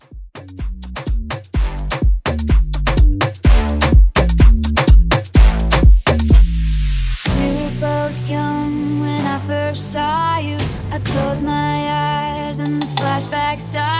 Kuduro Afro Mashup 126

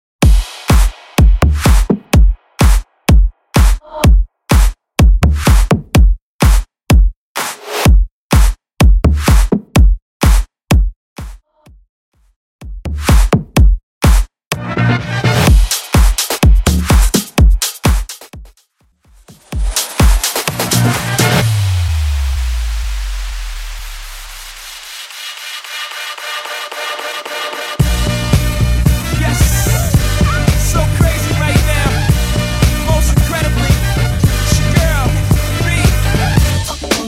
Bass House 125 Bpm; Vegas Edit

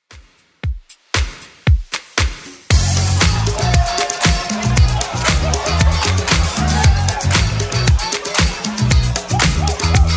80s Dance Redrum 116bpm

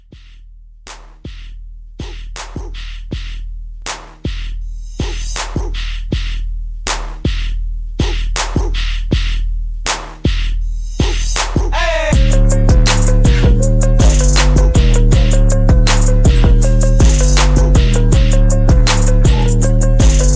Re Drum

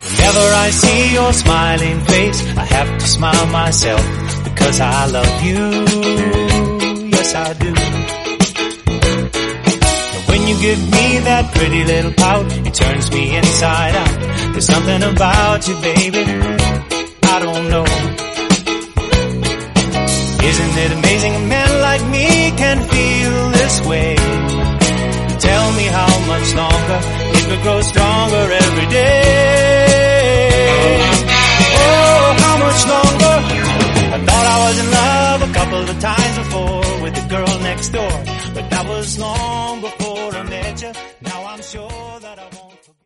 95bpm Abm; Redrum; Yacht Rock

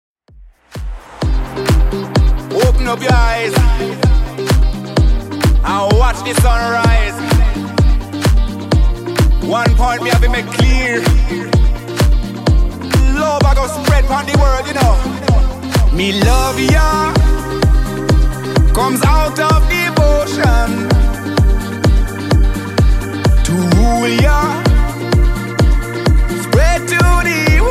2ks DJ Friendly Redrum 128bpm

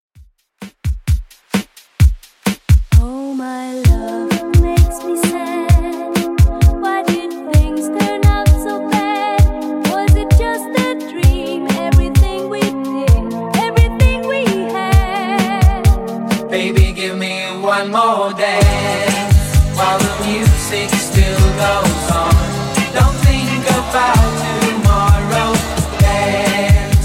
70s Redrum 100bpm CLEAN

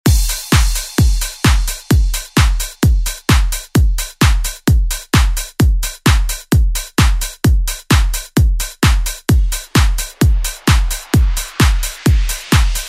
ReDrum; DJ Mhark

